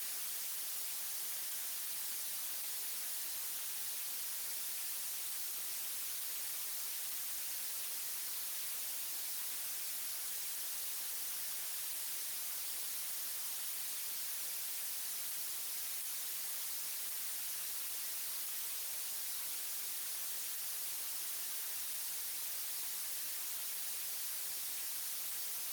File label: blue long quiet, colored noise